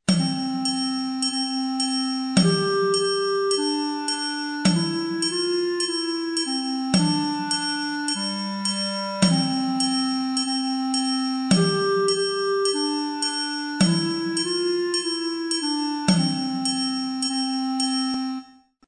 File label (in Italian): ritmico divisione